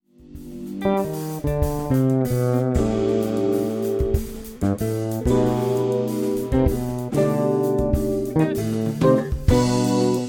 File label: Jazz Pool